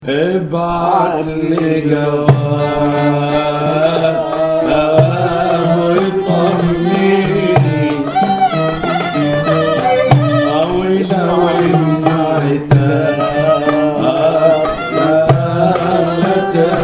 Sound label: concert; hama